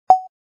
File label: Elevator button beep